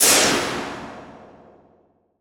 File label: fast bullet flyby; soundscrate